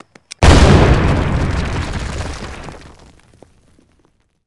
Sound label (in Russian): zvuki bomby i granaty